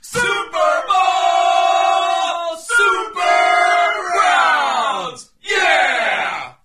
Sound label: super browns yeah; super bowl